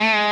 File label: Bend half Release; osu